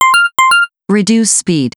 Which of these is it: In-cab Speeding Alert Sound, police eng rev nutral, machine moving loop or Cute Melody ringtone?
In-cab Speeding Alert Sound